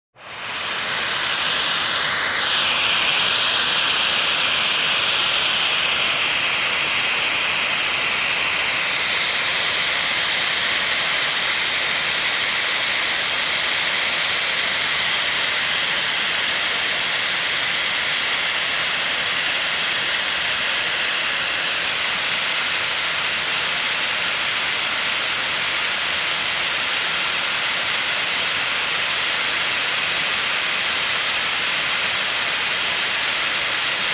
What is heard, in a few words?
Vacuum cleaner